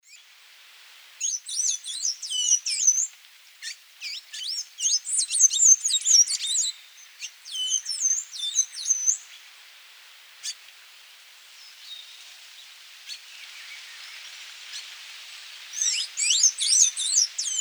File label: Thraupis episcopus, song; S. America; Eastern Lowlands